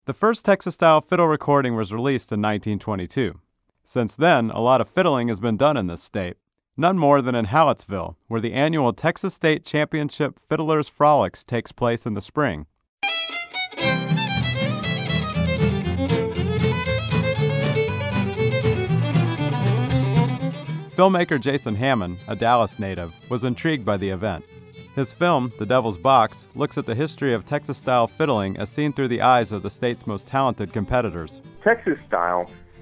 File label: fiddle, Texas style fiddling